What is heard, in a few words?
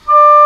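English Horn p D4; Digital Sound Factory Virtuoso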